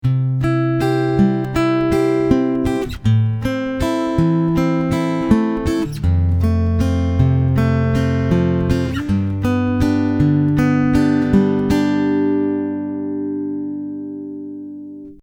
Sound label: odd fingerpick, acoustic guitar